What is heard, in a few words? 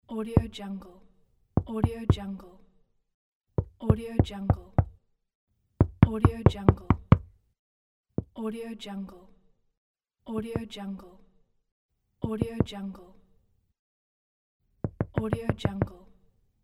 knocks on wooden door; dobreff